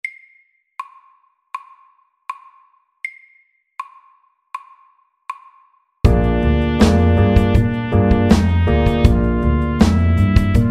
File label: Chromatic blues SAKTE